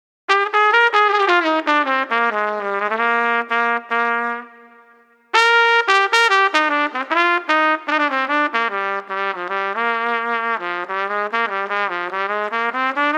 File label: Street Trumpet Pondering